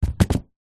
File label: container with dry rice inside set down on wooden counter; zapsplat; foley